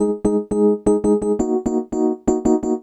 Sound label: Rhodes